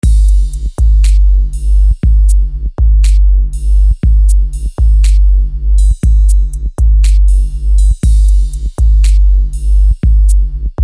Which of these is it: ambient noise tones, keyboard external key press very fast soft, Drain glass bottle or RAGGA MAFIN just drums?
RAGGA MAFIN just drums